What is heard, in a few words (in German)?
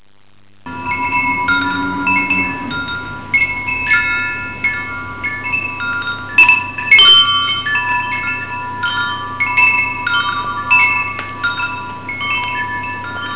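TUERGLOK, Türglocke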